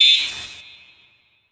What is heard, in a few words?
Transition Whoosh Alarm